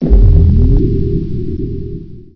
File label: gauss spinup, weapons